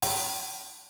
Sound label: half hat long